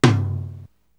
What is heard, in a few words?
RX5 TOM; Hollywood Edge; The Big Bad Beatmachine